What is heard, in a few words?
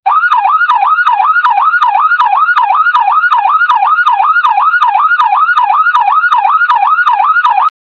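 uzbek music afghan rubab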